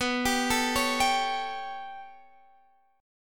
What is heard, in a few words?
B 7th Suspended 2nd Sharp 5th B down Guitar Open G